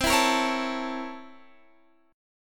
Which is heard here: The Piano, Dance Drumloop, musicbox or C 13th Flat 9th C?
C 13th Flat 9th C